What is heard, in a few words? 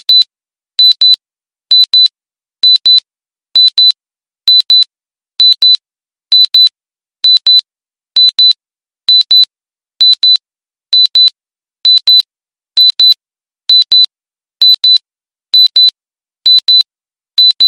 Digital Watch Alarm